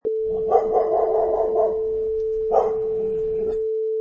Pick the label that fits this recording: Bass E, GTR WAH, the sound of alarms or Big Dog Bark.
Big Dog Bark